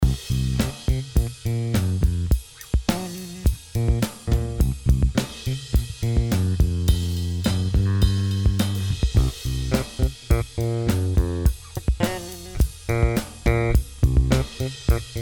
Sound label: Pickup Test